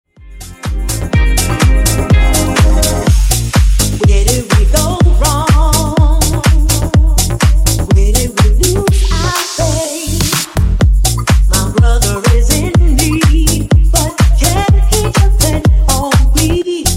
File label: Disko Funk 124Bpm